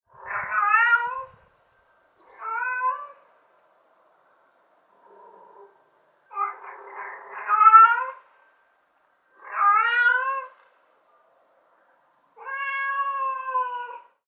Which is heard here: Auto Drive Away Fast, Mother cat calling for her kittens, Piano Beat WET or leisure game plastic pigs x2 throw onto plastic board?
Mother cat calling for her kittens